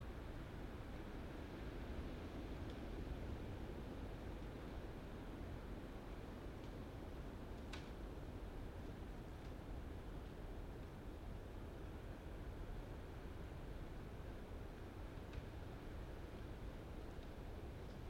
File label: yamanakako sound